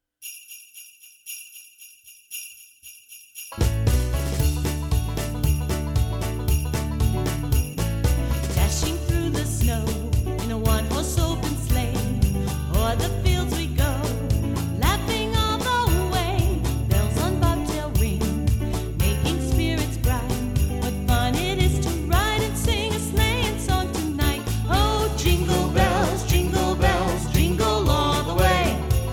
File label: Jingle Bells